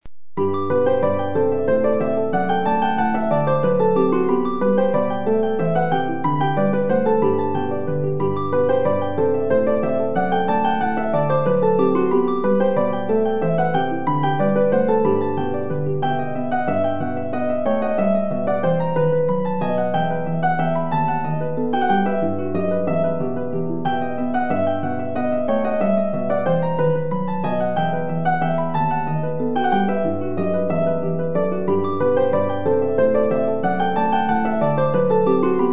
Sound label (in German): Menuett Harfe